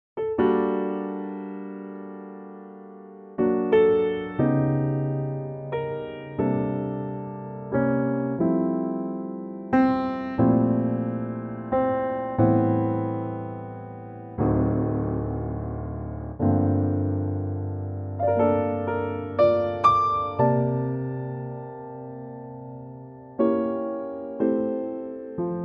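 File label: F piano track; Pianotrak; key - F - vocal range - E to E; Beautifully intimate piano only arrangement of the main theme, the verse is not included.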